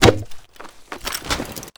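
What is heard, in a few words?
weapon foley javelin pickup remove cap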